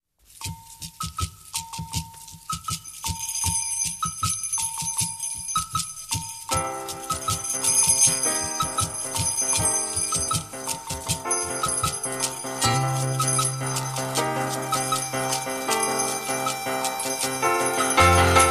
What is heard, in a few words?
samba